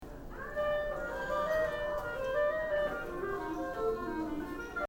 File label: trio; Ibert